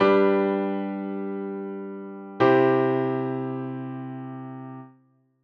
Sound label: dominant triad